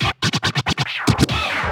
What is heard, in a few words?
Vocal Scratches 140BPM